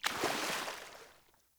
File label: Sandals Land Water, Footsteps; Characters; Player